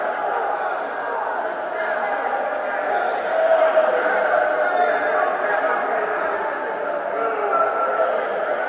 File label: crowd, arena; ambient